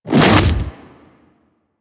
Melee Swipe; Enemies; Warframe; Infested; Grey Strain; Splitter

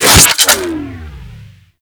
Microwave Pistol Stop; Grineer

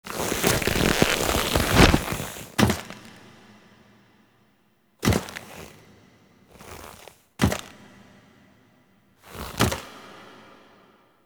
Kiss Foley; Ballas; Warframe; The New War; Throne Room

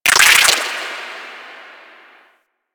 Ocean Underwater Plant Chatter; Warframe; Grineer Ocean

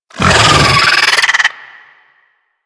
Pet Vocal Angry; Enemies; Vocals; Warframe; Alad V Pet

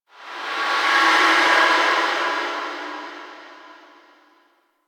Whisper Air Draft; Ambience; Warframe; The New War; Quests; Zariman

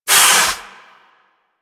Forest Water Pump Engage; Ambience; Props; Warframe; Grineer Forest

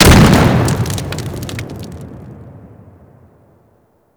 World On Fire Pillar Explosion; Warframe; Ember

